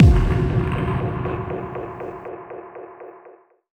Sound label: Impact